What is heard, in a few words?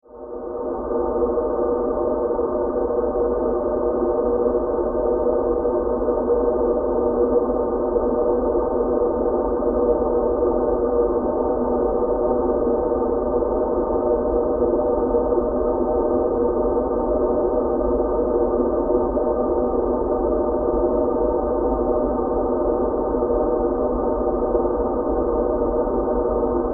Horror Drone Airy; Evil